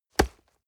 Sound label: nylon leather hit